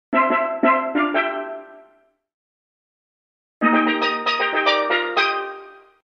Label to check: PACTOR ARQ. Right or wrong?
Wrong